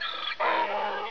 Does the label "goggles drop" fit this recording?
No